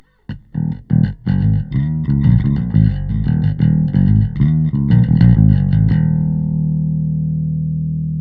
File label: 808 Bass bypass; SubBass Doctor